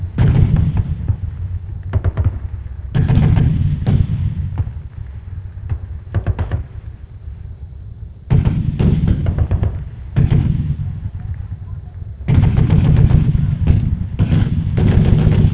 Amb Destruction; Red Orchestra Ostfront 41-45

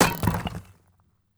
stone construction; Rust

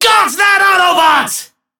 Hit Confirmation; Starscream; Entertainment

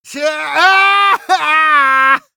death; Escape from Tarkov; scav6

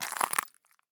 walk glass; Escape from Tarkov

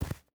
footstep boots snow run; Rust

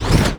arm joint punch

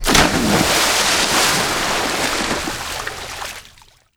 body into water

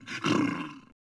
Bear Fort Vox; Civ4 warlords

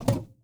ui pickup wood med; Rust

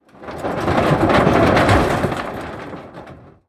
explosive objects; World of Tanks

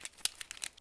goggles use; Escape from Tarkov

